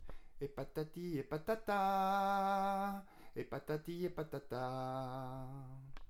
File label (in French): refrain rapide